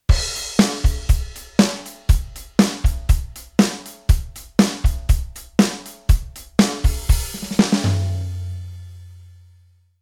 Rhythm Kit Pop

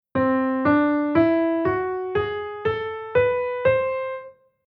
Chord mode C Lydian 5

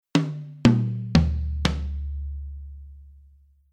Rhythm Drum Set Tom

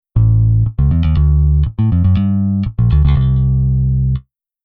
Bass

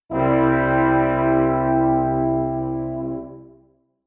tuba

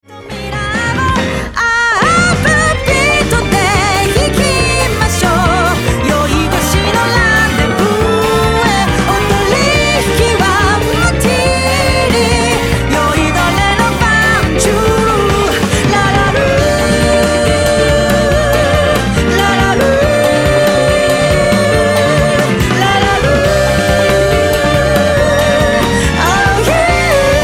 Mastering Effect EQ

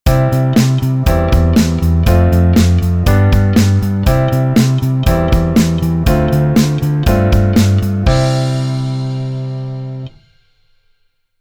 Bass Arr Root 1 Oct Up

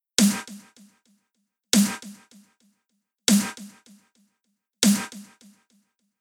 Delay

